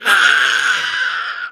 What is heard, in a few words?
Raptor Hatchling Death